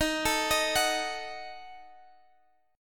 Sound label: Eb Diminished; Ebdim arpeggiated; x,x,13,14,16,14; JGuitar